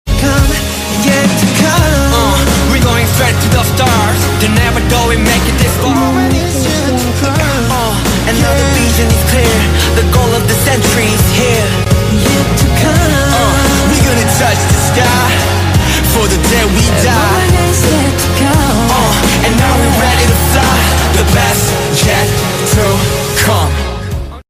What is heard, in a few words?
korean ringtone